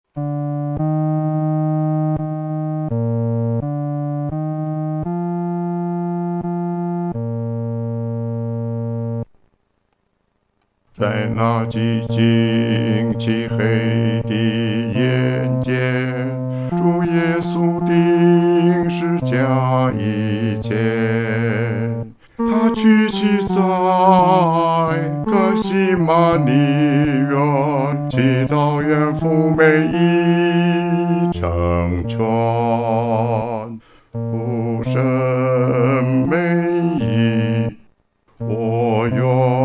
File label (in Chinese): 独唱 第四声